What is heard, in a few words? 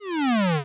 fall, square waveform; Square Waveform sfx